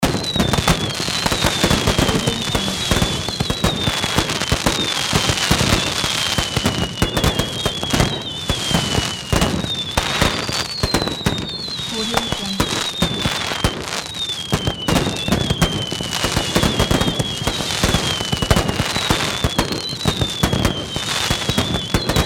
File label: fireworks ambience; urban sounds